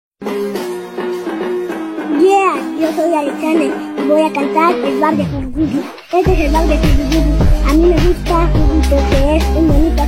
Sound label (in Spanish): el rap; huggy